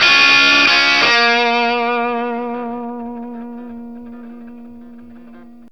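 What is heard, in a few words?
BLUESY B flat 60, Vintage Blues Guitar; USB Soundscan vol.22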